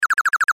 Sci Fi Computer Screen Readout Digital Display Loop